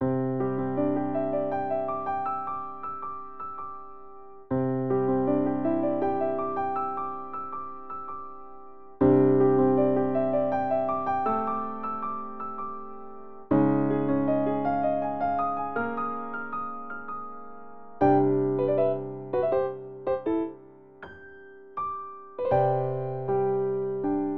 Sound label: Piano; Super Mario Odyssey